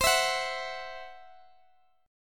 C Major Flat 5th Guitar Open D; down; chord shape

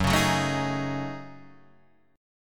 Gb Minor 6th Add 9th Gb down Guitar Standard; strummed; JGuitar

